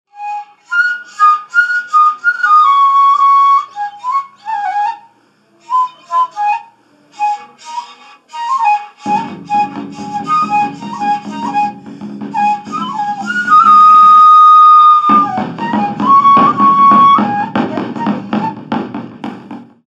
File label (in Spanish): Tambores chiriguanos